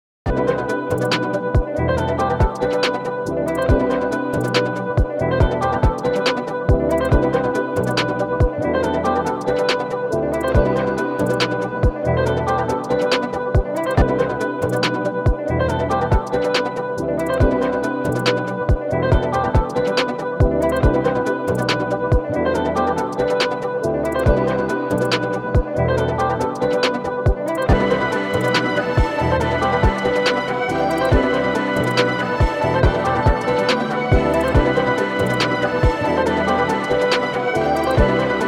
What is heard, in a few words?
Tubular, EDM Pop